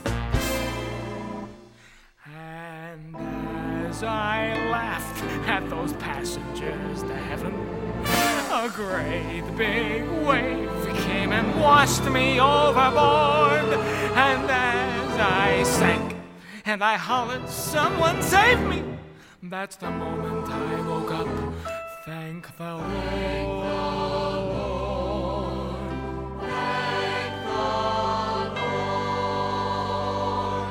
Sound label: Vocal